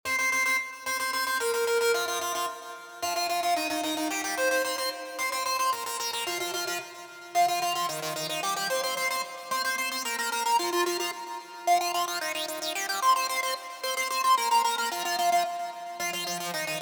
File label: Sailor Lead; retro 80s synth wave; retro 80s synth wave presets abx3 vol1